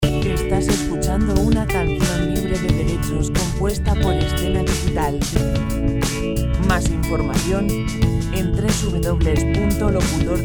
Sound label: 90 documentales lent royalty free music; baladas